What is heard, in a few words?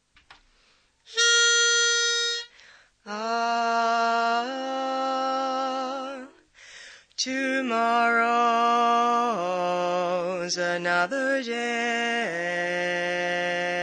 Bass predominant